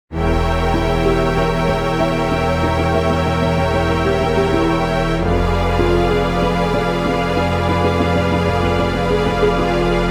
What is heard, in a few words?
RPG evil; Event